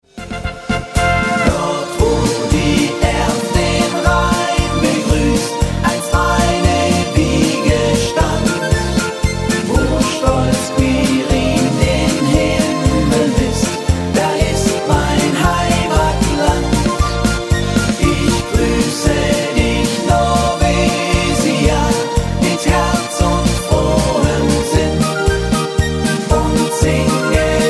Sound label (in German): mit Chor